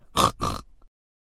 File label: Snorting